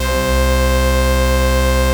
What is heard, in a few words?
OB VOX B2; Hollywood Edge - Giorgio Moroder Rare Synthesizer Collection; OB 4 VOX.